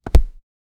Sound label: sound of punch on armor